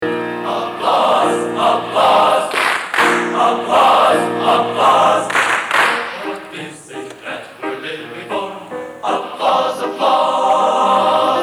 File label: Applause